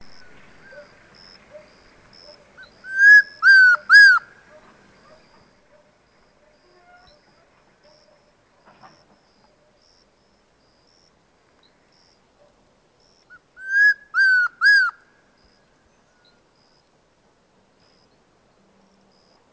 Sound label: Chestnut-crowned Antpitta